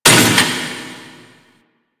Vaults Stake Gate; Warframe; Entrati

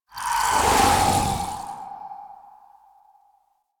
Edge Cast End; Warframe; Pacifist

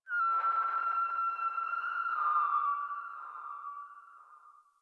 Landscape Distant Robot Call, Ambience; Warframe; Venus Open World; One Offs

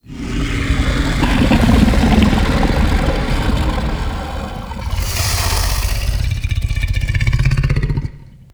Pit Monster Exhale, Infested; Sleep; Warframe

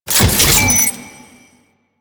Moa Hack Finish; Warframe; Quests; The New War; Veso

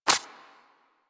Bird Of Prey Step Dirt; Warframe; Footsteps

